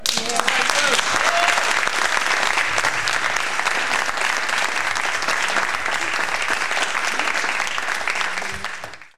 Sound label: applause